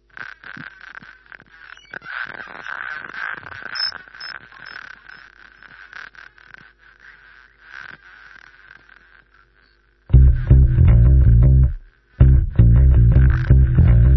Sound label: positive tension